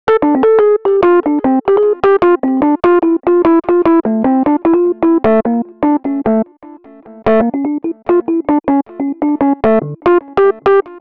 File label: Rhodes Solo, Cinematic Funk; Cinematic Funk Urban Tone Poems; Xotica